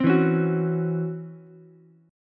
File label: Db Minor Major 11th Db Ukulele C6; DbmM11 strummed; JGuitar